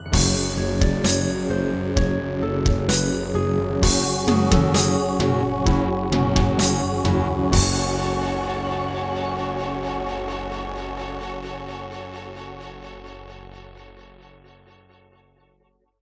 Casio LD-80; Doom (Sigil); Introduction

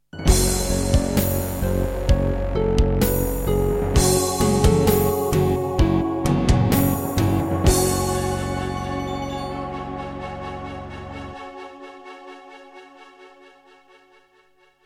Roland SC-7; Doom (Sigil); Introduction

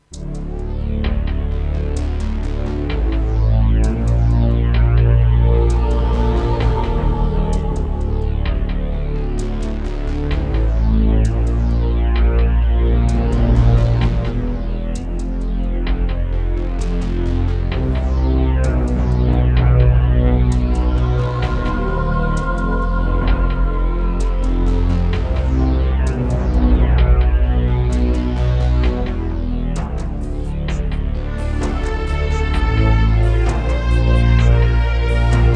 Roland SC-55; Descent II

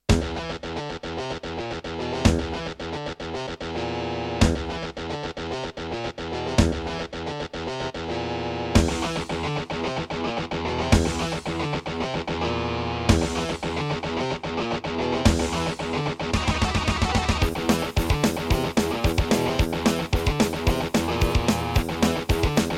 Korg Audio Gallery AG-10; Doom - E1M1

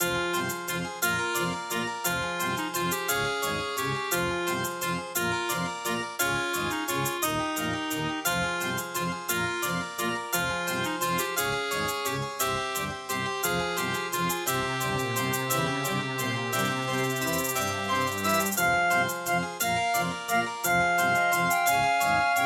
Track 6, Yamaha DD-55; Death Gate

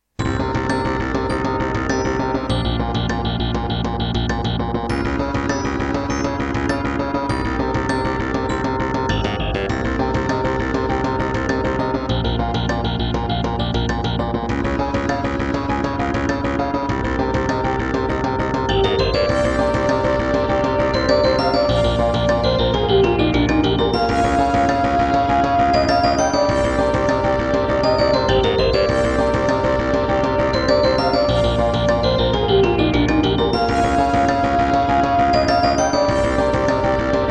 Serdaco Crystal Blaster C1; Hocus Pocus

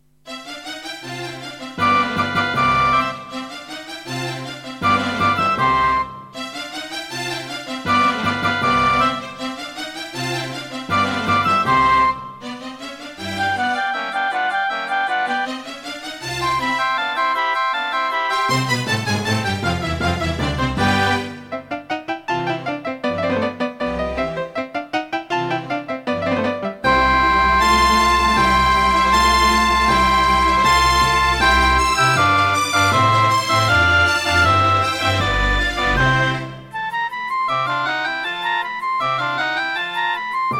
Anteg EasyMIDI EM-100; classical; Mozart; Concerto K467 partial

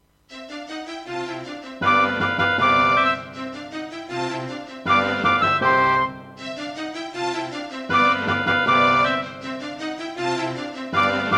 Hammond GM-1000; classical; Mozart; Concerto K467 partial

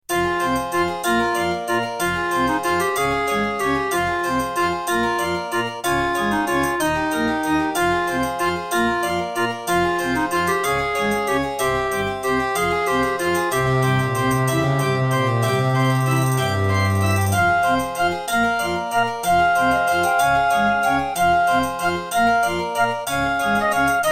Roland SCC-1; Death Gate

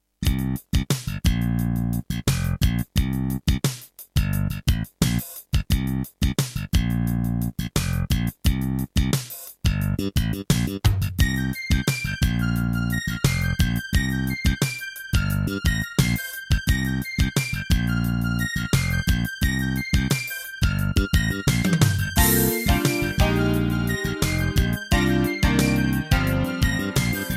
Terratec MiniWaveSystem TTWT

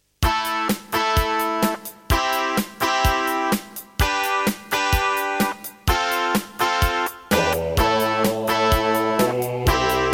Roland SC-8820 55MAP; raptor call of the shadows

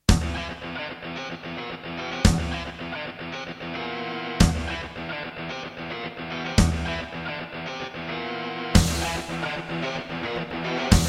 Alesis NanoSynth; Doom - E1M1

